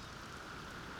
Background Noise

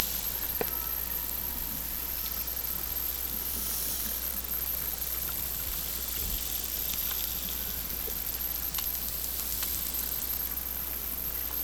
Hydrophones, orcasound

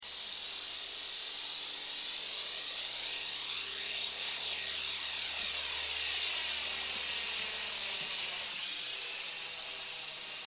100m noise

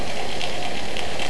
Snappy Tanker

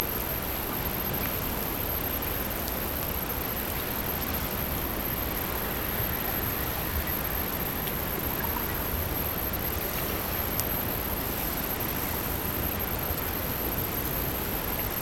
Hydrophones, orcasound

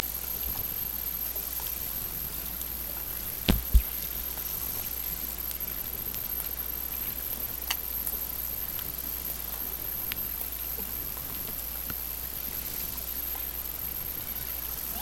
Hydrophones, orcasound

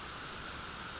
Background Noise

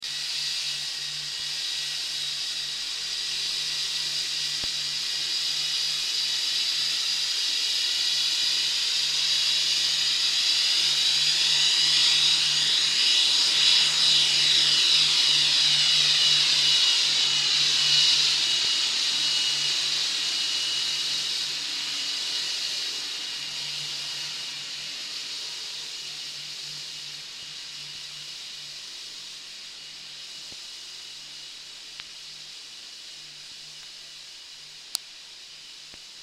50m noise other boat

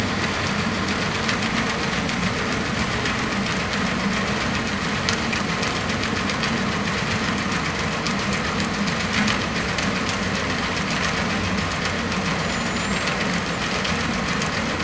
Hydrophones, orcasound

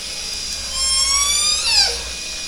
call S 1 long Some Noise

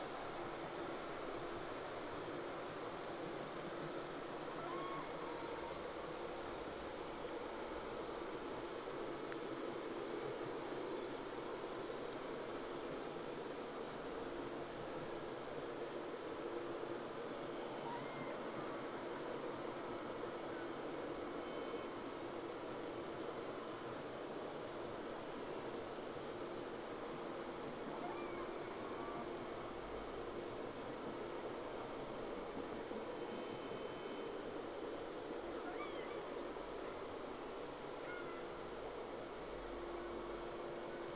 Whales; SeaSnake

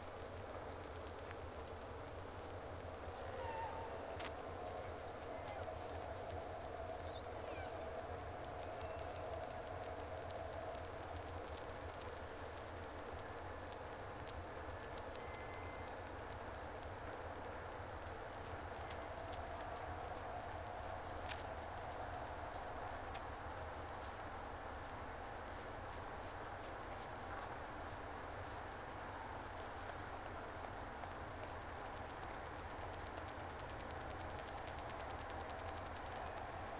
Whales; SeaSnake

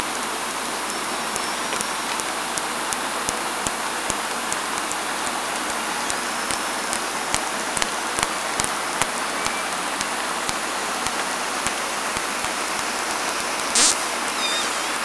Orca sound Night Passby

